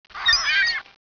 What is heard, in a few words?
one gull